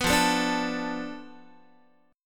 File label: F 6th Flat 5th F down Guitar, chord; F6b5 strummed; 14,13,12,12,13,x